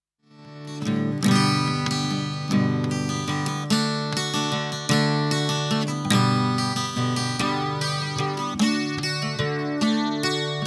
Guitar Chorus in drei Abstufungen; Boss CE-1

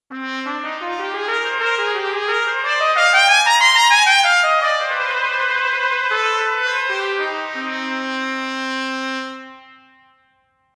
Brass Solo Trompete Sustain f

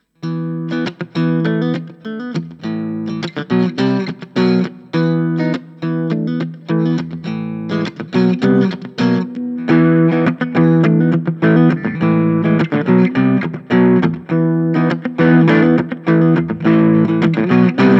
Mitte und Boost

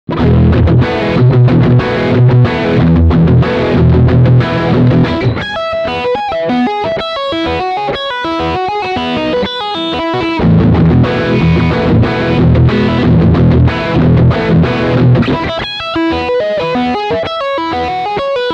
Riff Lick beide Humbucker

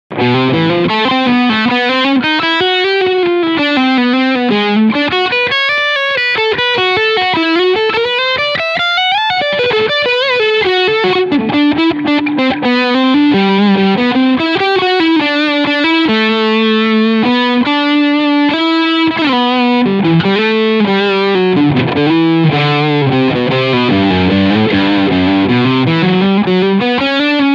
Skyhawk E-Gitarre Overdrive alle Pickups

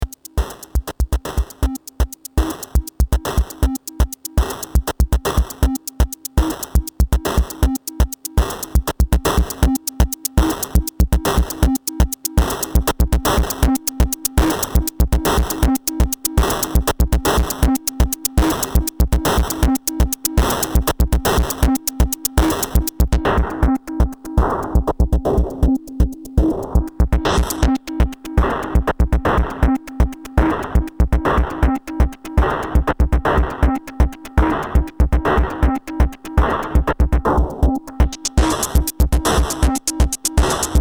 Bitcrusher und Distortion Modi; 1010music nanobox razzmatazz

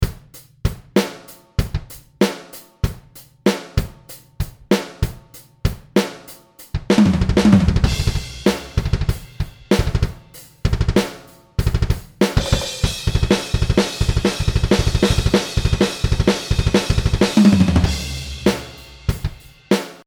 Rock mit Ueber

